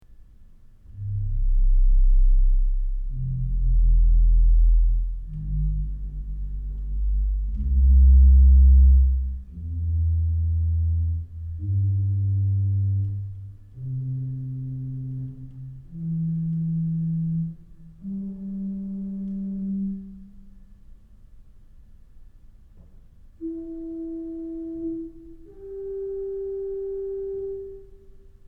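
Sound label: Pedal Diapason arpeggio; Kellogg Auditorium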